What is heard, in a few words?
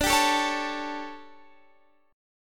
D Major 7th Suspended 4th, Guitar, Drop D